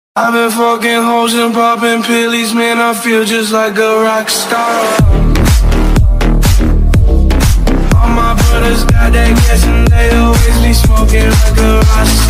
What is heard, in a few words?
English Ringtone; Hollywood Joker Movie